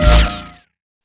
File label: forcefield down; trek